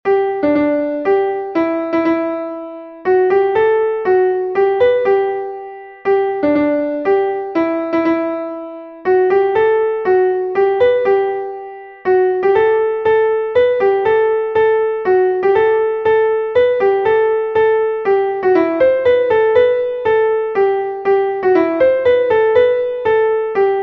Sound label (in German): klavier; gaudeamus igitur; melodiemeister